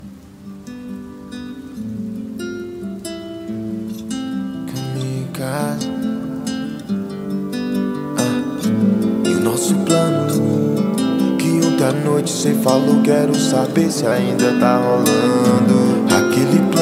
rap; cd rap flash sound

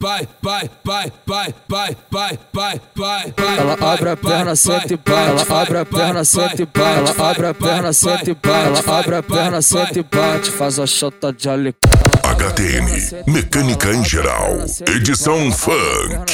mecanica em geral edicao funk

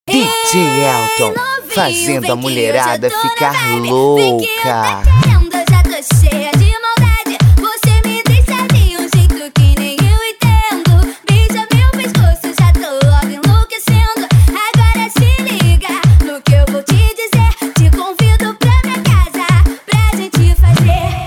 eletro car automotivo

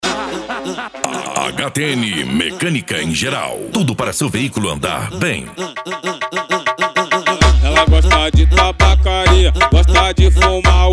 funk e lambadao

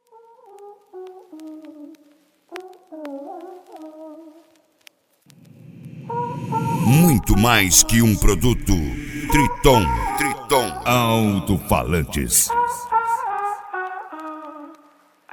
triton bass, Alto Falantes Especial Bass

